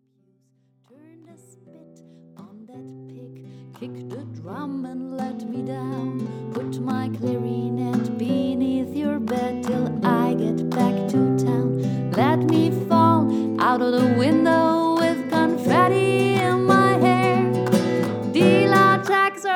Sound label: tango